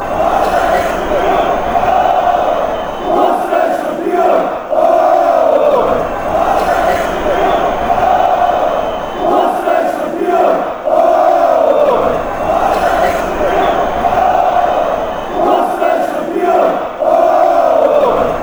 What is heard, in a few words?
bosnia champion oooh, chants